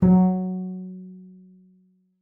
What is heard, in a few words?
F#3, bass pizz; Xsample VOL-5